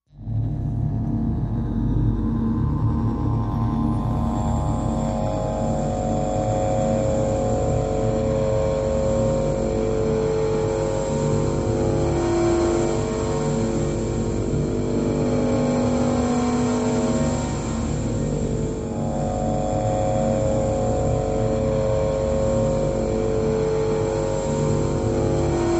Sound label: Tubular Essence Steady Electro Pulse High Metal Polish Ambience; power core hum